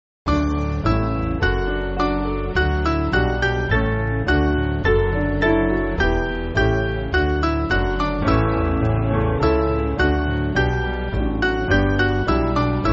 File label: Piano, church music; Christ Is Made; Grafton